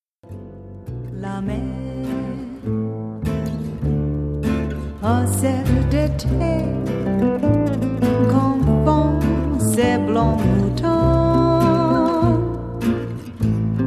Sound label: trio with guitar vocal